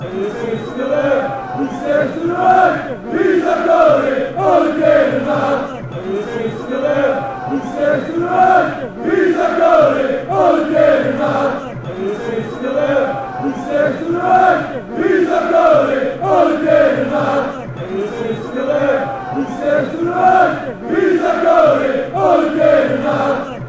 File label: mechelen chant